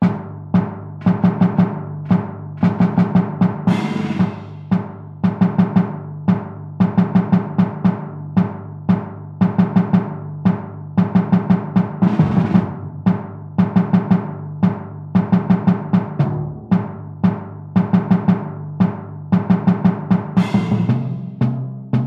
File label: Airship Corridor; NSMBW